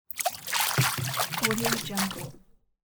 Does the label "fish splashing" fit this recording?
Yes